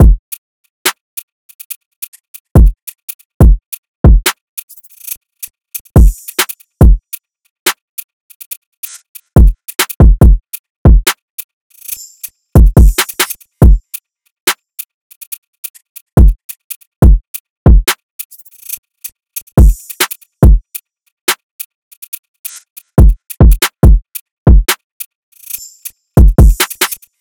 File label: Staggered Drum Loop 141 BPM; Cymatics; Mystery Pack Vol 4